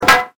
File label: FALLING